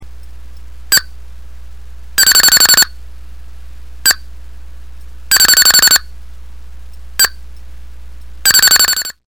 low battery

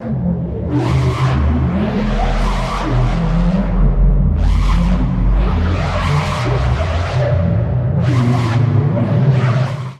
screeching

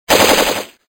mach gun

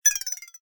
scroll

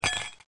ICE

